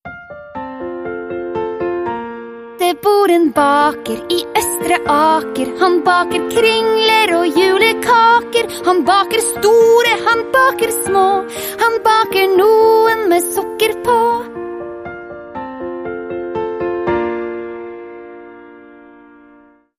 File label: With Piano Intro